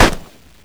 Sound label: 30cal; WAR